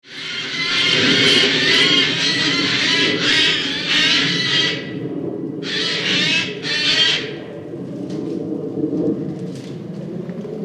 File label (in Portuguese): gaio